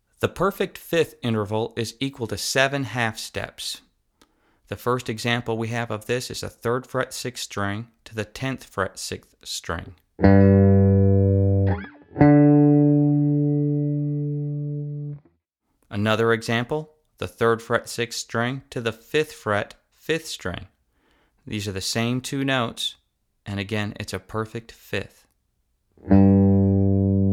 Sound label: Perfect 5th